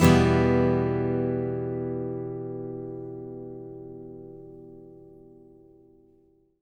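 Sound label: Acoustic guitar E minor up, Country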